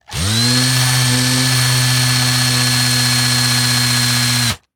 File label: Industry Tool Power Drill Fast Vibrating